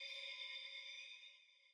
arrow button hover

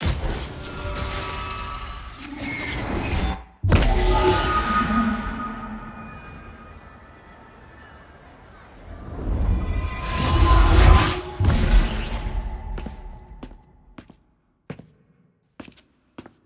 hexgate click

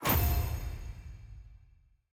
celebrate receive generic

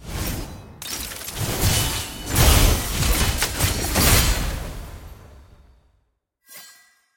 sfx tier crystals promotion to gold; challenges

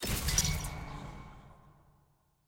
ui warning enter

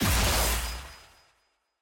sfx finisher; samira

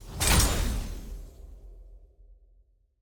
sfx minimum tickets bid; clash; lol

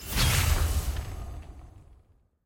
sfx mastery; eternals eog mastery 2

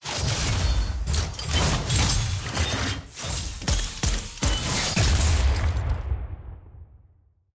regalia tier promotion iron to bronze

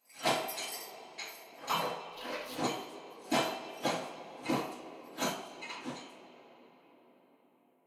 sleep exit phase

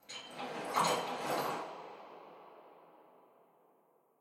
enter happy phase

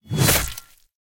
action bloody attack

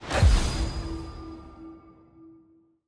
masteries tree resolve activate; collections; perks

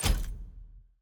login notif login fail; parties

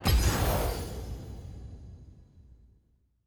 nav button play click

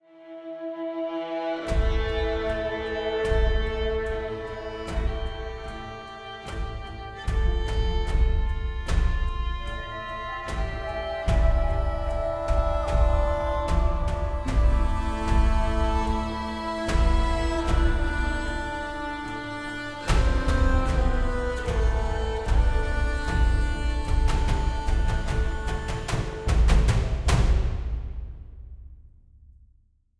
music; allrandom banphase howlingabyss; aram

